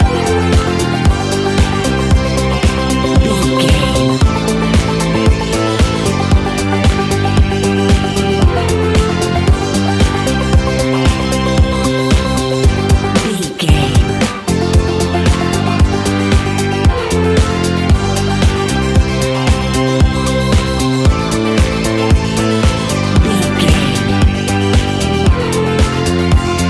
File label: shimmering dancefloor, A fast paced piece of electronic dance music